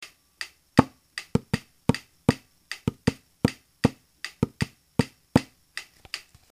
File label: Rhythm